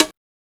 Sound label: rim